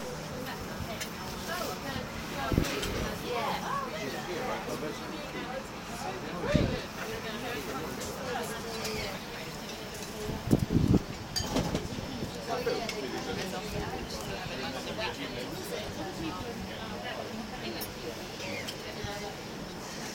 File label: the dove pub hammersmith